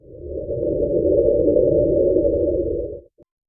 alien vision heavan sound

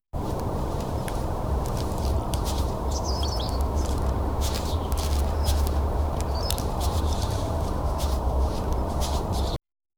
slow footsteps on; sparrow

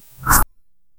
a soft and subtle ui, cloth

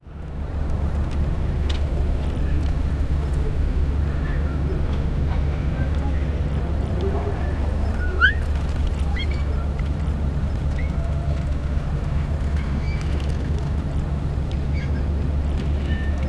soundscape of, maritime

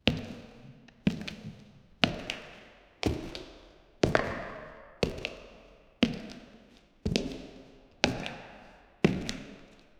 boots wooden floor gym hall

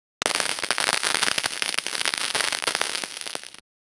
fire crackling, campfire

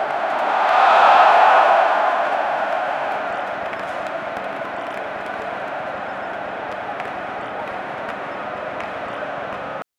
stadium during a football, stadium

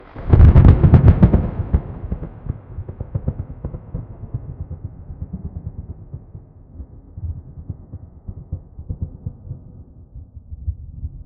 faraway thunderclap with

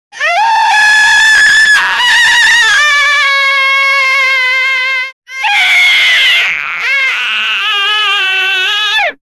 sound effect of crying clown

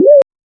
pick up

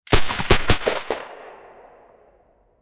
heavy mech rifle; 2 ton

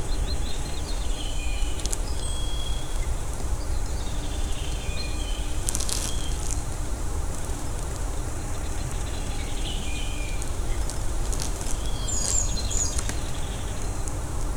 ambient forest sounds wit

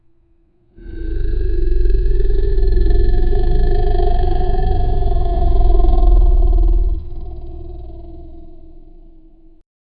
a loud deep eerie unsettl; reverberating

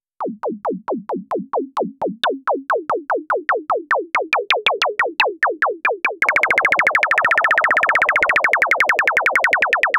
nice music, cosmic

